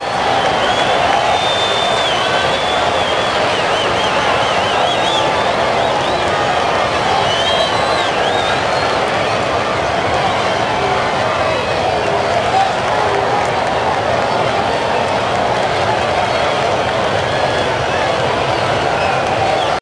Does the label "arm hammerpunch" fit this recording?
No